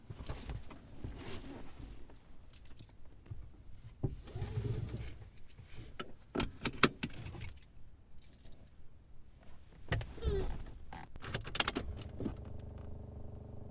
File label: starting a car